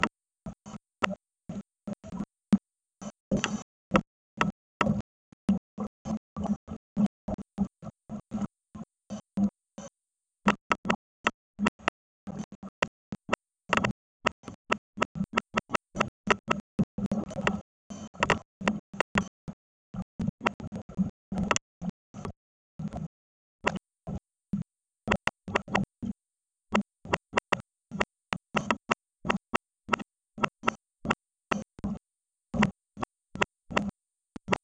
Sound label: UW soundtrack; Sipadan Video Soundwork